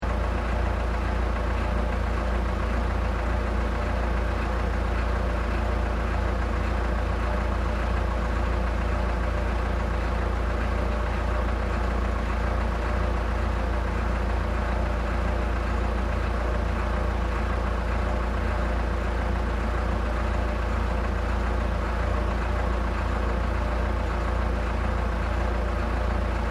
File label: Construction Vehicle Road Roller Idle Loop, Road Construction in Sannohe